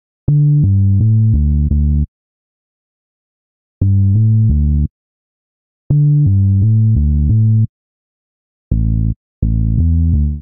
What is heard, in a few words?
Bass D Minor 85 bpm, Bassline; MIDI Clips; Tonal; Beat That; Ableton; Factory Packs; Chop and Swing